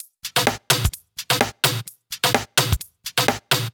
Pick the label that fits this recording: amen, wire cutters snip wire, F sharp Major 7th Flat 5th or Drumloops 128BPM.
Drumloops 128BPM